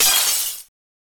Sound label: small plate breaking